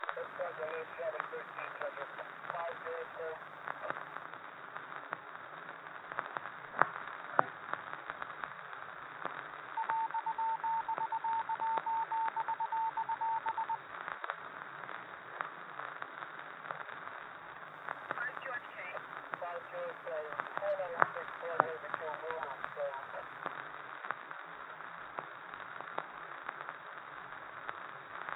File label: Ambient Comms Room; Among Us